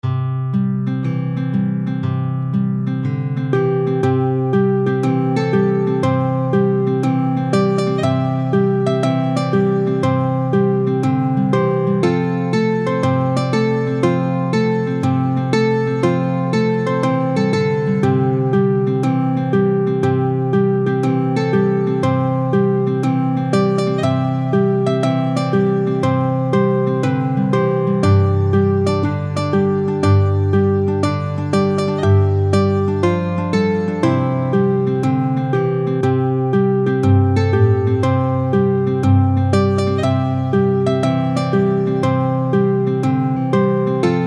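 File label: Ukulele Guitar Duet; Worried Man Blues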